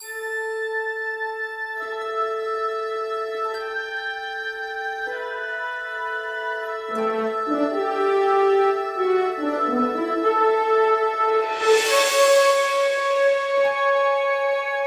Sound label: ringtone; star trek the original series